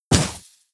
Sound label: arrow split; weapons; dota; medusa